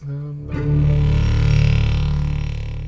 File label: dirty bass